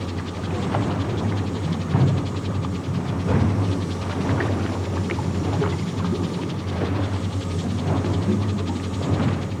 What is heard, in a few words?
chemical plant; factorio 2.0.55